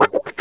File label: WAH SNIP, Funk Gtr & Wah